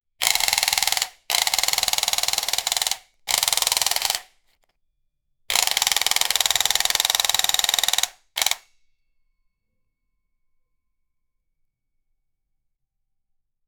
various shoots of an 45, handguns; SFX Engine

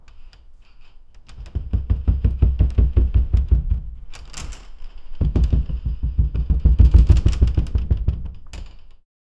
wooden cupboard doors rat, earthquake

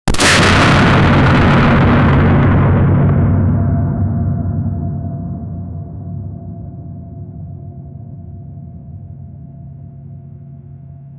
destruction and crackling

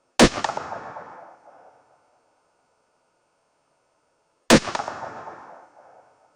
ak47 gunshot

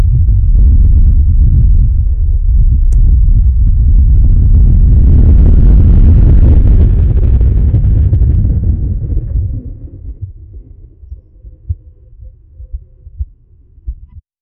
earthquake sounds

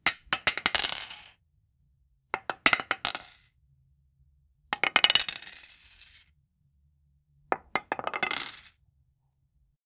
asmr knife cutting stone

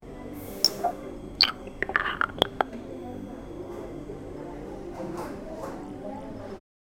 drinking coffe in cafe; food and drink

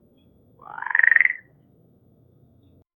a single frog croak clean

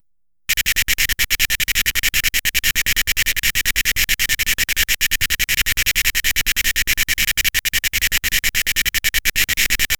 ambience classroom; metallic scrape

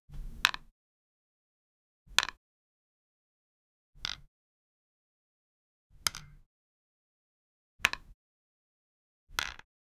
five different short soft, card game

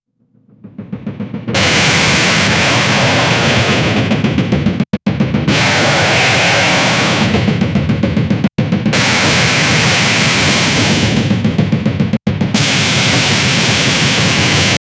death metal church organ

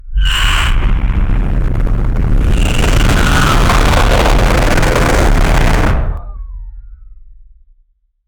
a low and deep scream, human scream

